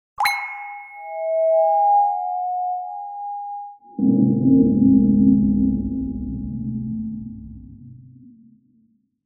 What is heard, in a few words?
TEDxAarhus Jingle